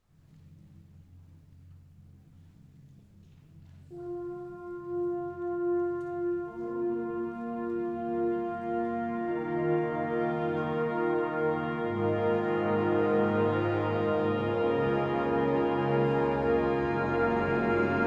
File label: epic fanfare